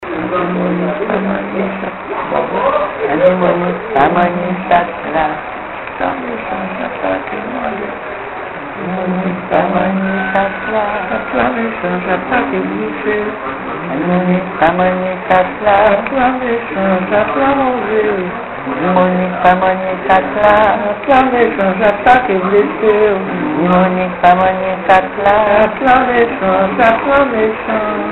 chant

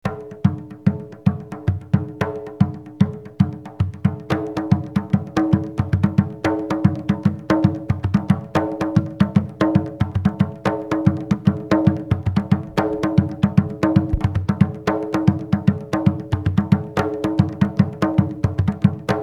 rythmes takouta basse et rythmique

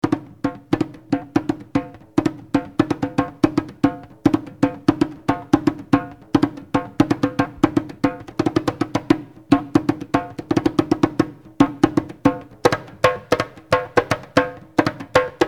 rythmes takouta solo

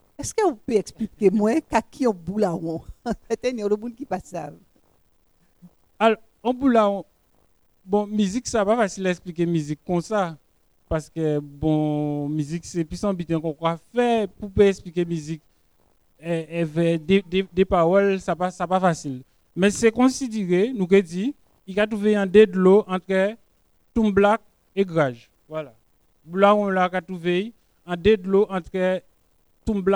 Le rythme boula

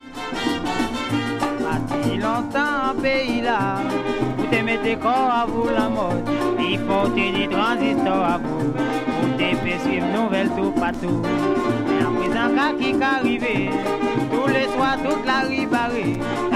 musique calypso

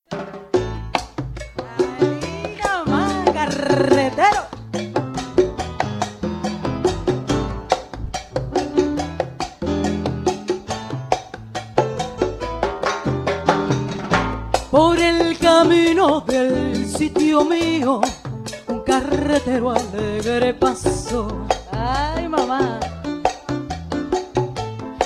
musique

